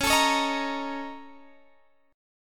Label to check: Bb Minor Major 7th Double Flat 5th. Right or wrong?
Right